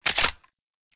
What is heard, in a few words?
Pistol Reload Mag Out; Warframe; Tenno; Lasrian